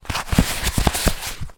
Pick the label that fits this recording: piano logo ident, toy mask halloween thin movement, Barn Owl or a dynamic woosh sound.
toy mask halloween thin movement